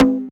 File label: Conga Mid Drive; One Shots; Drums; Ableton Live 12 Lite; Core Library